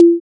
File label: perc soft